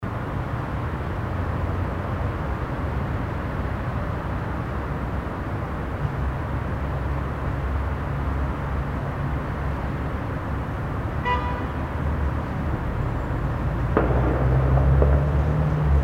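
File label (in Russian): гул машин в городе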